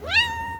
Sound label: meow; netfishing; dialogue; calls